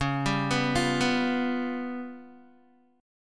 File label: C sharp 7th Flat 5th Guitar, chord; C#7b5; arpeggiated; JGuitar